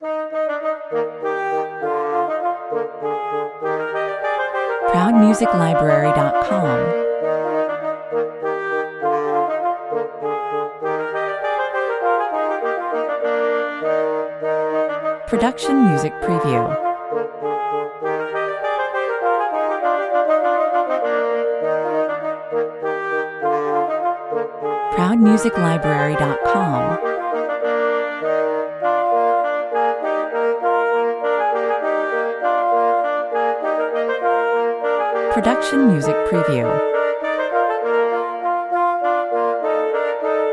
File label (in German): duet polonaise fuer zwei hoerner 100 bpm